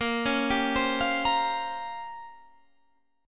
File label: A sharp Minor 6th Add 9th A sharp down Guitar Standard, chord; arpeggiated